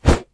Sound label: swing; monster